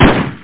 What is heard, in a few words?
pistol